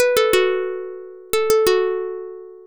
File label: plucked